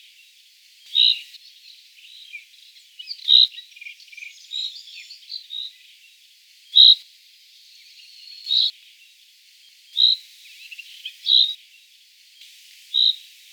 call, chaffinch, rain call - SEX/AGE: adult male - COMMENT: Sylvia sp. song and rain call from another Chaffinch on background; fringilla coelebs